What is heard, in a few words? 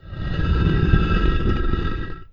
button slide; cstrike